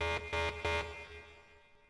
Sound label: subway